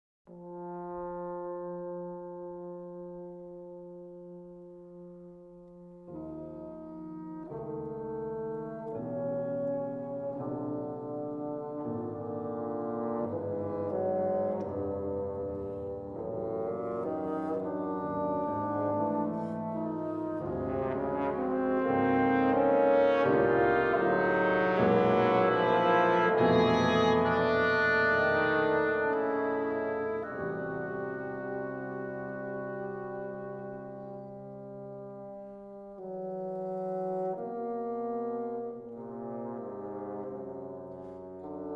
Suite for Wind Quintet and Piano I. Adagio religioso; crawford seeger; chamber works